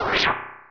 Sword Inside; Open Zelda; Quest Designer 2.0.4b; Weapons

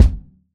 Kick; Ableton Live 12 Lite; Core Library; Drums

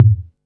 Tom; Ableton Live 12 Lite; Core Library; Drums

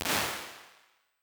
Early Reflections Vocal Wet L; Impulse Responses; Ableton Live 12 Lite

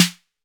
snare; Playdate SDK; MIDI Player

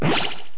Life Sword; MMBNMMOP

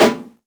Snare Vinyl, drums one shots

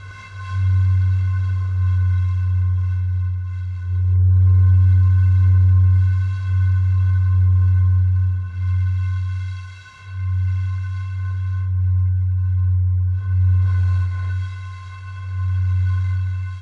Whistle Stone Champagne F sharp Loop; Journey

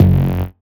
Synth Bass PCM

